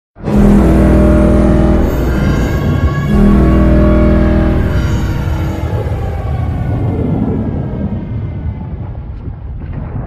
arab funny horn; galactus

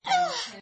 uuuhhh

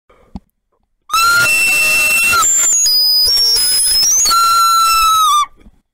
recorder ear rape

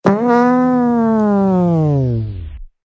awkward fart down

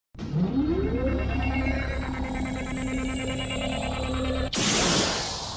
hologram spawn

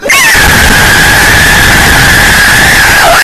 rat screaming

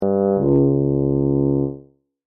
tuba; jimmy fallon

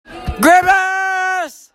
haha; grimace meme

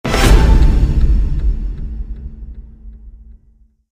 survival victory sound

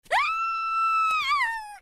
scream japanese; lucy heartfilia

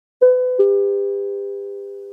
intercom chime

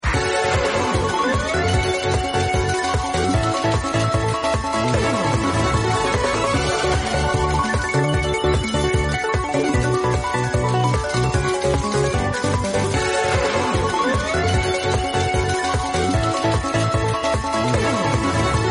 win music

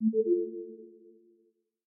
notify

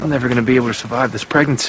ringtone

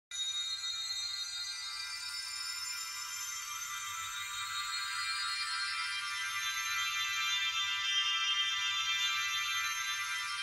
magical transformation

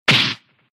grabbing sound; dragon ball z

